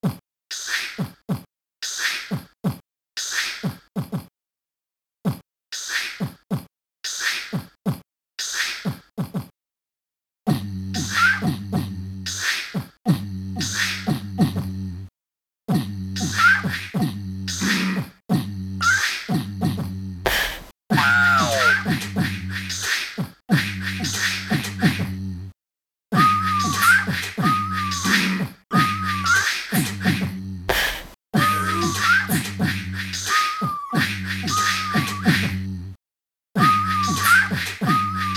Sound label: voicenoise beat